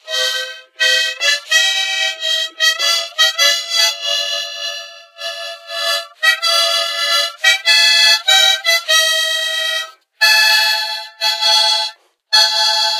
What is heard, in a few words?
harmonica